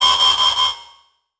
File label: Transition Metallic Warble; Production Element